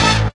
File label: BRASS HIT